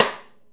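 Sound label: snare A3, multisampled real kit; REAL LIVE KITS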